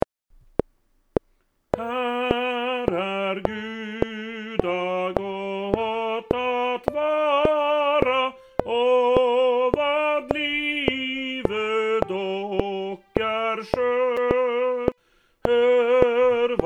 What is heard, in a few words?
Tenor CLICK